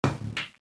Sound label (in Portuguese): sinuca